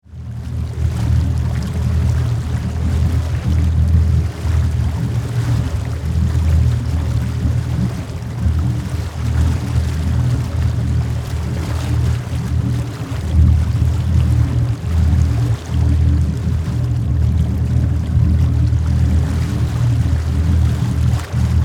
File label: Vehicle Boat Drive Slow No Wake Zone Waves Splash; Boats